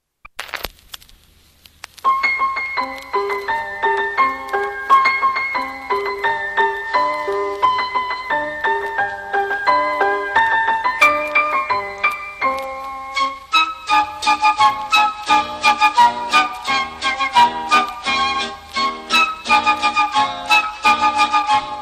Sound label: orchestral